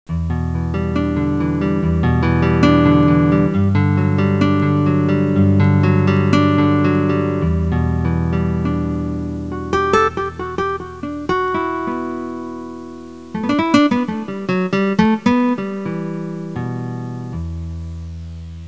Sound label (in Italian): audio suonerie musica mistica